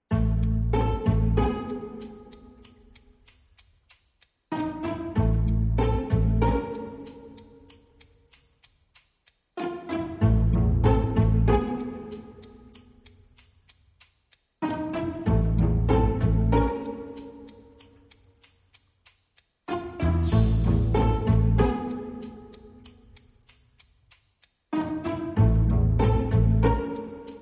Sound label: Hide And Sneak, No Marimba No Hang Drum